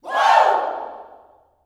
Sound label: "Woo", Vocal Gospel yell shout; Spectrasonics; Vocal Planet Vol.1 Gospel; akai